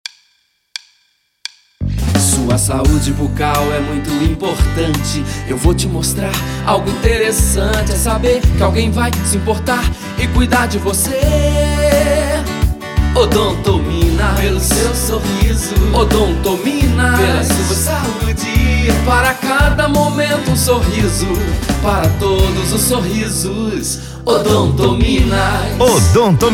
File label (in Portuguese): JINGLE; ODONTOMINAS